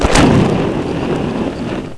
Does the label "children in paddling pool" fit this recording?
No